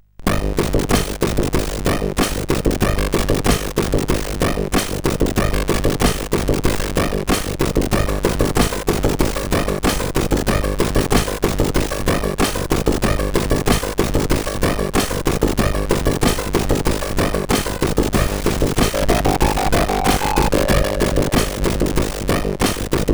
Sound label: Techno Rap, sid CSG8580R5